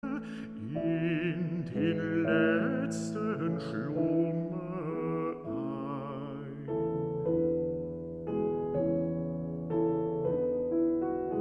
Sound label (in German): Vokalmusik